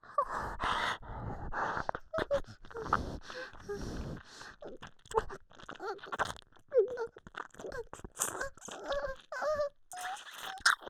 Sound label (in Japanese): 触りあいながら耳舐め